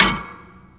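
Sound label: crowbar hit; cstrike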